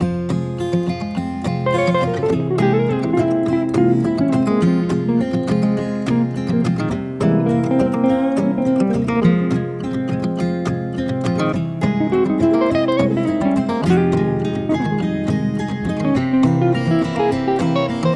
Muzyka dlya sna, Zvuki Spat

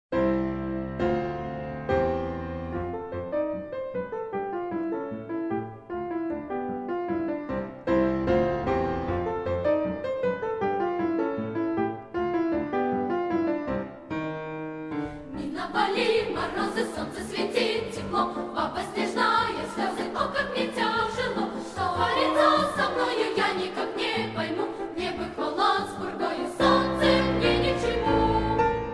Detskij hor

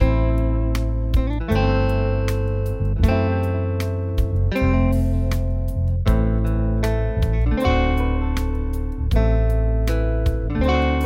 Spokojnaya gitarnaya muzyka

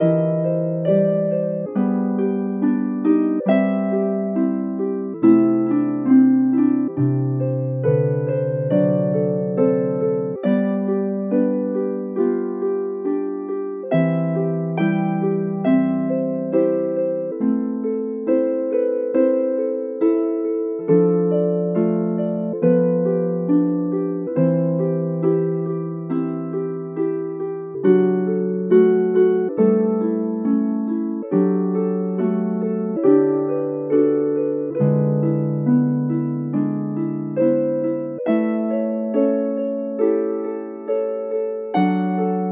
Zvuki Arfy

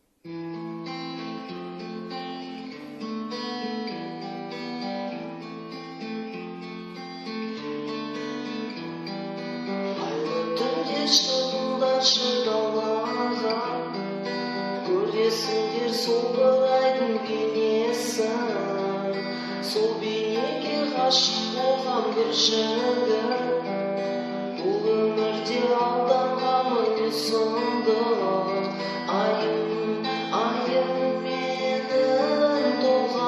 Gitara diktofon

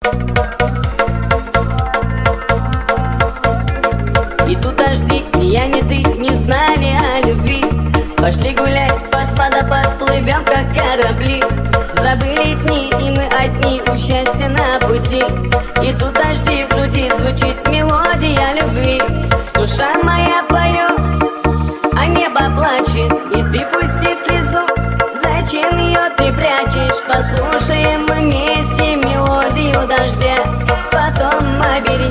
melodiya dozhdya lezginka

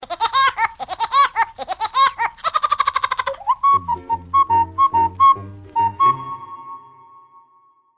Smeh dyatla; Vudi Vudpeker

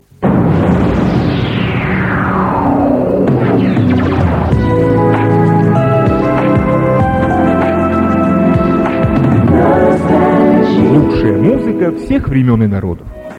CHasovaya otbivka; Radio Rossii

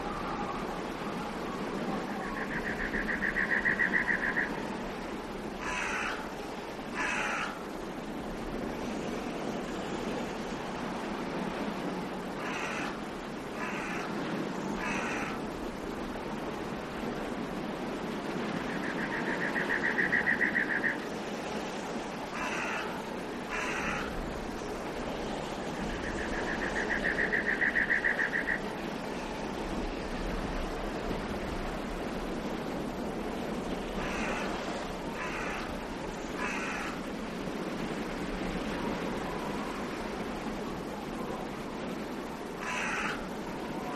pustaya ulica veter kriki ptic; RolePlay SOUND

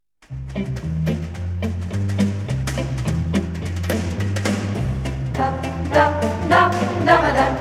vokaliz

